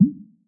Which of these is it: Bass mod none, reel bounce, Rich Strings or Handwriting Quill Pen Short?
reel bounce